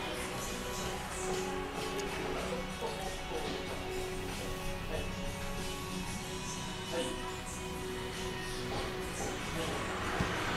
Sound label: iroc flom hush, muffler